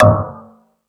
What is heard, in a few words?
Industry, Drum Machines E-MU Pro-Cussion